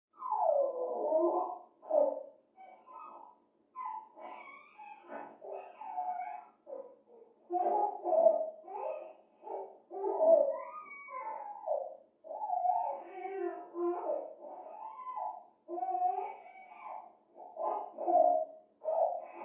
Baby; Happy And Excited Squeals And Laughs, From Down Hallway.